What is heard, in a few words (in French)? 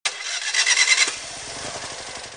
Démarrage voiture